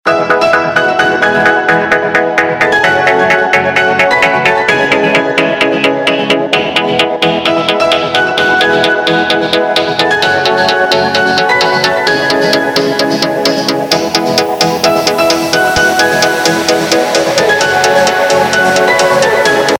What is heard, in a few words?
Instrumental Ringtone; Bollywood Hits (2014)